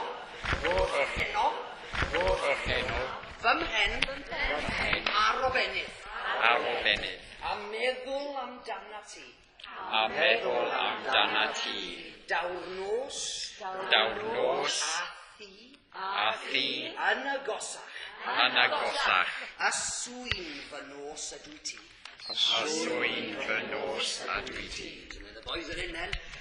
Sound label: Welsh